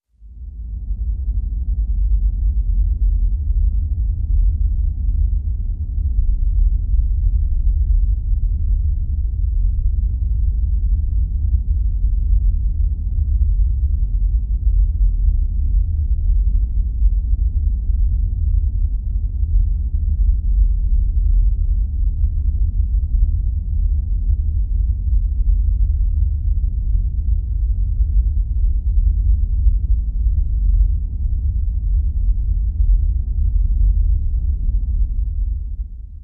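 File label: drone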